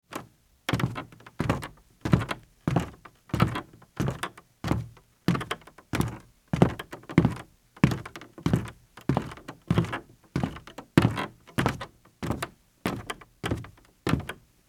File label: Footsteps Sneaker Wood Walk